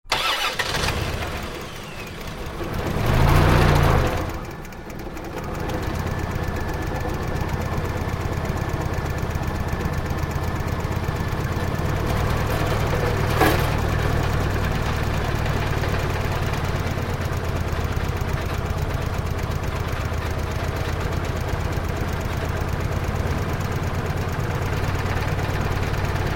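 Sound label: car starter sounds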